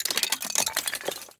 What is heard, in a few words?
ice shatter glass